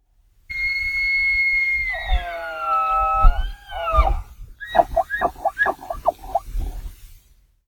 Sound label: Elk Bugling, Point Reyes